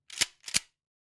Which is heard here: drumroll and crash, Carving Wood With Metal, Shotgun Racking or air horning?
Shotgun Racking